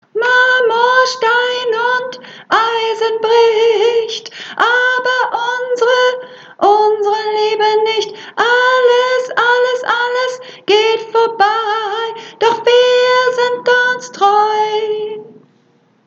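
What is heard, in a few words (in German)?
Sopran, Frauen Männer Medley, Übungsaufnahme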